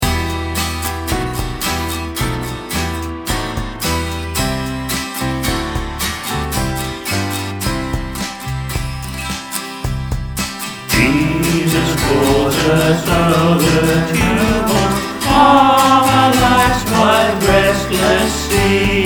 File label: choir; Jesus calls us